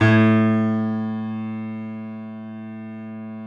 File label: Small Pianos, 3-D Audio Collection